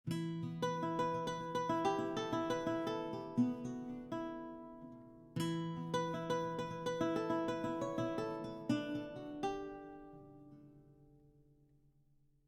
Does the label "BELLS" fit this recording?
No